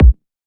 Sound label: One Shot Piano House Kick Clean Drum Sub Thump Fat